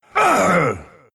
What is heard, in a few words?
pain; Dazz